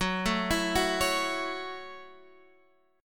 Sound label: Gb Minor 7th Gb Guitar Drop D; x,9,7,9,7,9; down; chord shape